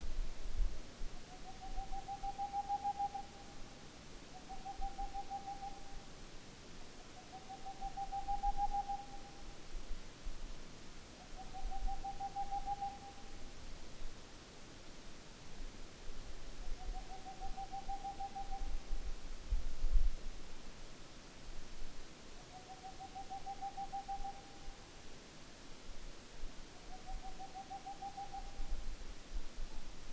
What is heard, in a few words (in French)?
Chouette de Tengmalm chant diurne; Aspe